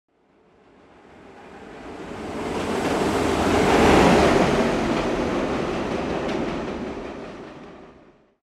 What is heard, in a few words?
train pass; zps; Ambient